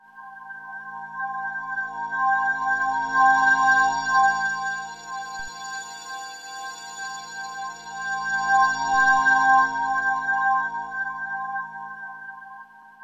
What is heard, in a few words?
FROST; Spectrasonic Distorted Reality 1; FROSTY